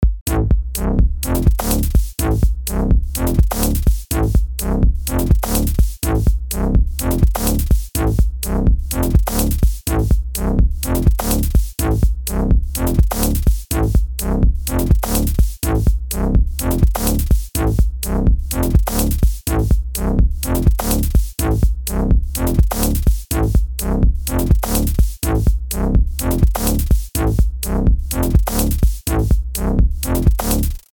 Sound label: Loud engine; Retz